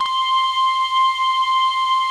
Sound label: SINE MELTS, pads; Pro-Rec - Synthsonic; TR PADS